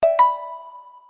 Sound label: meeting mic turn on; openim